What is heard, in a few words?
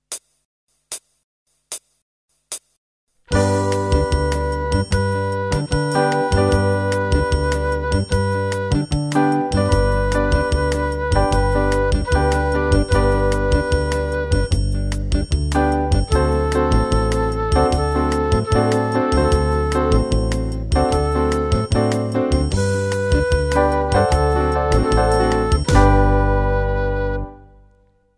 2 note samba, flute